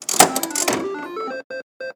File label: spin